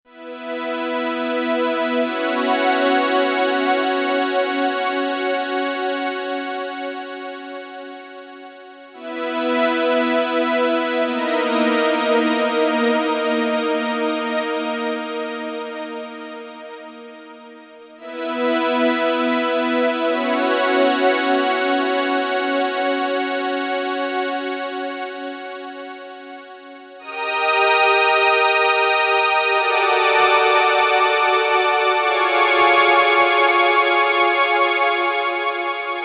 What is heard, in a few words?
Strings with Novation PEAK